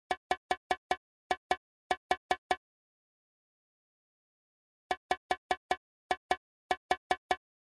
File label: motiv rhythm